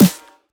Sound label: Snare, Dubstep Snares